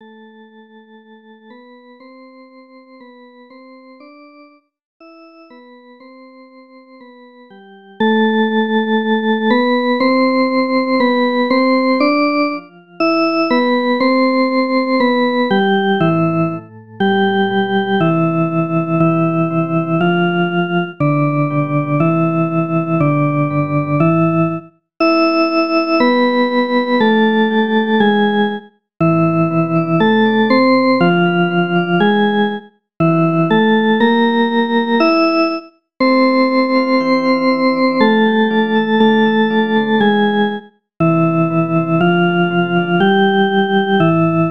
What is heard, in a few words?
Ave verum Mezzo; Poulenc